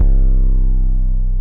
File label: Tuff Dist 808, 808s